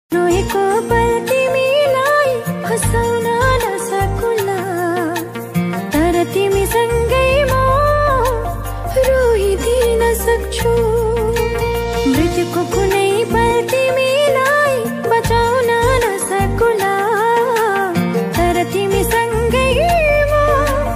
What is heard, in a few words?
nepali romantic ringtone